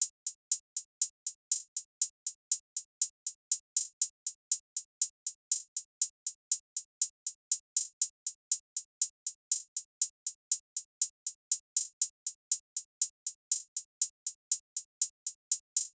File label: Drum Loop 120 BPM Hihat; Cymatics; Vibes II Drum Loop Collection; Bright Drum Loop